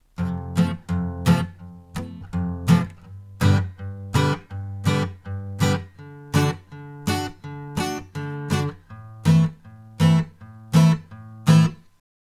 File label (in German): Mackie EM USB Rode NT1 A Gitarre